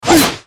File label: Salt Shot Harpoon